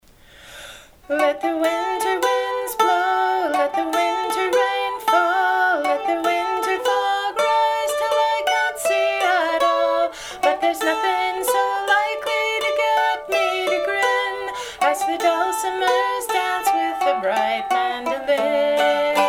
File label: Dulcimer's Dance